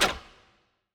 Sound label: Cursor; Treasure of Nadia